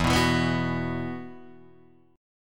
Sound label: E Suspended 2nd E, Guitar, DADGAD; 2,2,2,4,2,4; down